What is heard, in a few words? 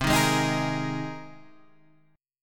C Minor 7th Sharp 5th, Guitar, Standard; strummed; JGuitar